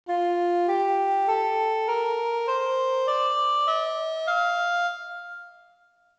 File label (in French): jiharkah, La gamme jiharkah; GAMME EN MODE DE FA = JIHARKAH